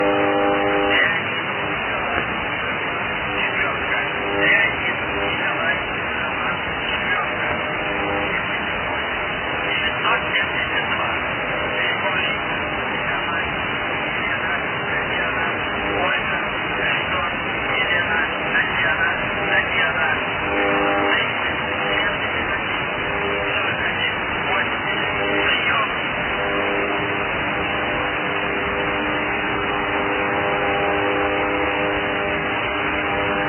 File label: pip chatter, numbers stations